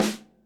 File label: low tone acoustic snare g sharp key; snare drum samples